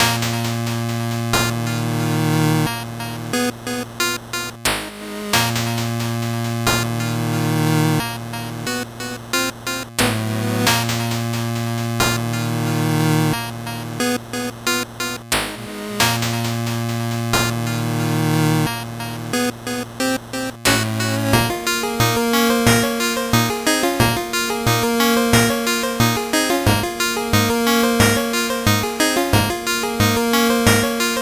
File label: 8bit bgm slow